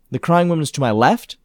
crying left; blindside

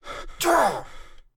dodge success; Pitch Black - A Detective's Demise

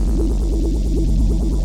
Scifi Hover Sentry Loop; SFX Kit; Sci-Fi Loops

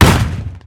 gun shot; Blind Drive

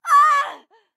town human road hit; Blind Drive

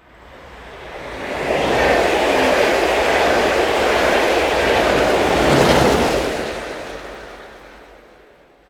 train fast passing; best service Blue Box

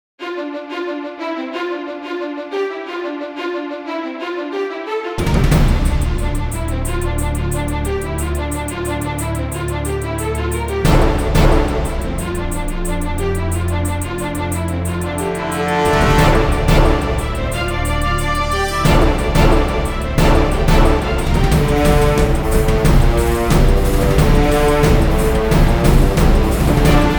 (No Choir); Shockwave Sound - Fantasy Worlds Vol II+III

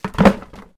Book Drop Trash Can; SFX Kit; Foley - Misc

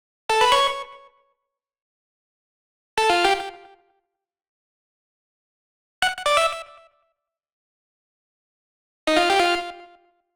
Arcade Jingle Neutral

